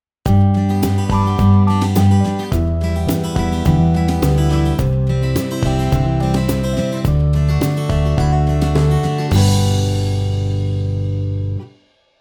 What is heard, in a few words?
guitar